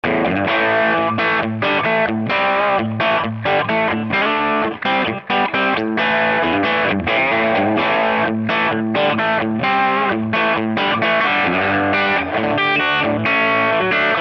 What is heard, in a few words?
Tele Overdrive; Squeeze -> Overdriven Amp; compressor pedal; Tangerine Squeeze